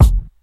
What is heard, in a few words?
high quality rich mids rap kick drum one shot f sharp key